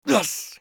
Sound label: bear wound; eft